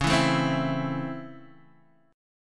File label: C sharp Minor Major 7th Sharp 5th C sharp Guitar Open D, chord